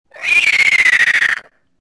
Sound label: death; mine